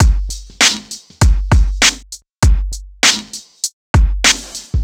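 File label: raw break loop; Rawcutz - Nu Forms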